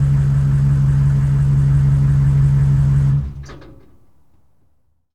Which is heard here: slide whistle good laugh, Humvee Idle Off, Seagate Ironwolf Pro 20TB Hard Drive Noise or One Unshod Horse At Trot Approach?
Humvee Idle Off